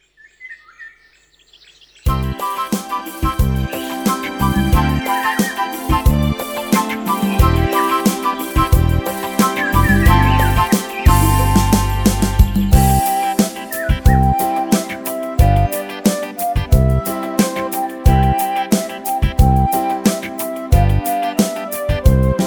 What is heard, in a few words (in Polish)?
ptaszek